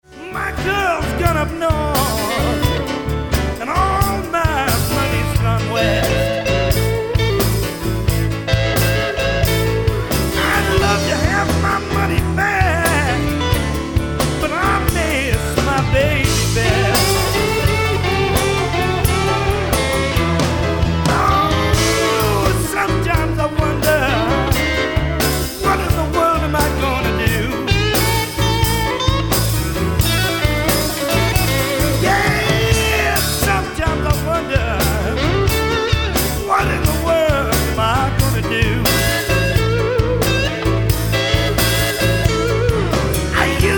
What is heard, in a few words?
Long Night Blues